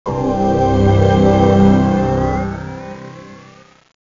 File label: spaceship terminal startup; easteregg